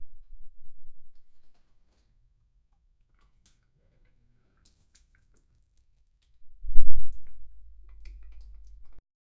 hair tumbles down

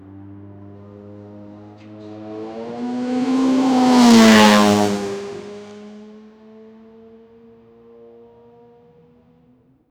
fast race car slowly pass; racing

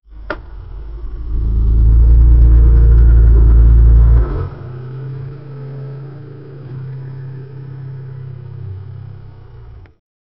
sound effect maclaren 720s, sports car

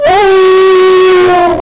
scared elephant

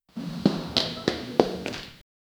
bursts into classr, classroom

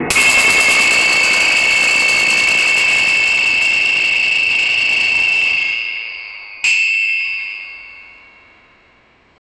a heavy metal dumbbell

